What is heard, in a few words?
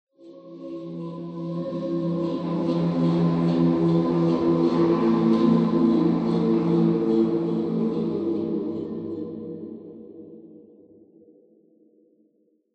Jazz